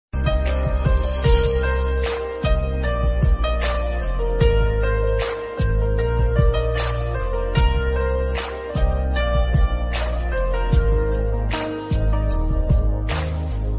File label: Instrumental